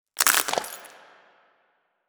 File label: small legs up down; Stellaris; biogenesis; ships